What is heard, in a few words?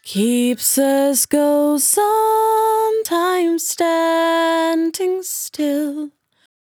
100bpm Bm, Velvet Vocal; Zero-G